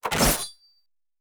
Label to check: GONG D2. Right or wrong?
Wrong